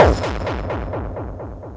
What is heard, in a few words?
Dub Kick FX C2; Club Techno